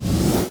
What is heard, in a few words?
Magic Prepare; Hollow Knight; Mage Knight Magic Prepare